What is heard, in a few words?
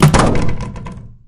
plastic barrel break; physics